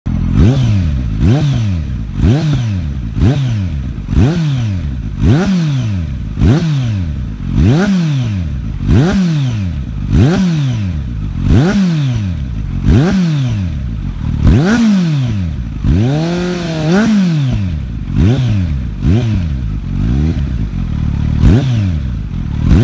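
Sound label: Z900, Auspuffanlagen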